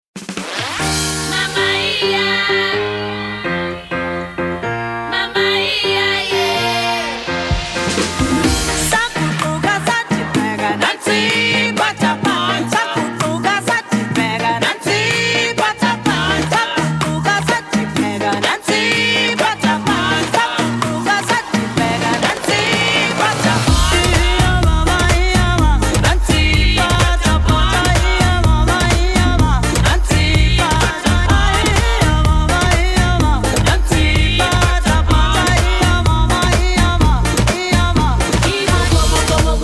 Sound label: Cha Cha Cha